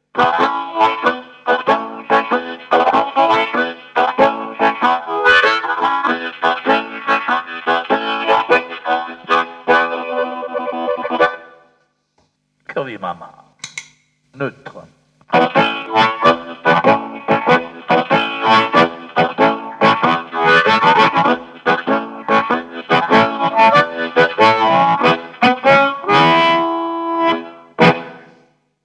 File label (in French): Harmos avec et sans